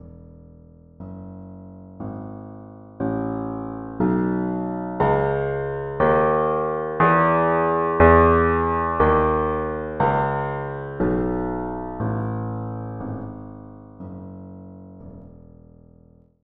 E scale

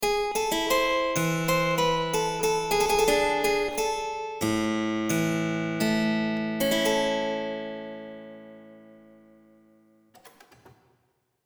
cadence Body

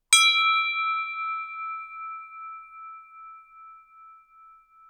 bell

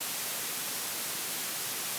gaussian noise; VelvetReverb

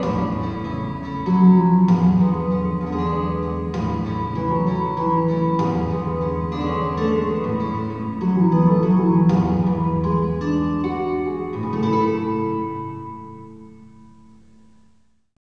echo

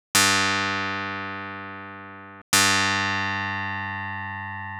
ripple

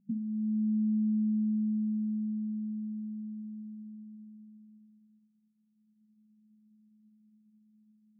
coil, tanbur

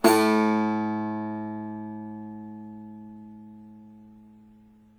hard, tanbur

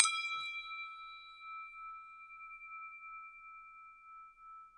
bell synth

